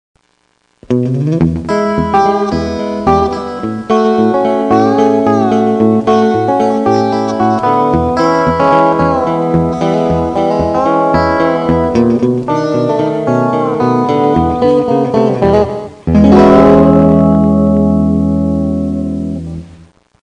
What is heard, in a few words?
chetabilly